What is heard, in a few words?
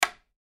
Light Switch Flip; Light Switch Flip Hard; Household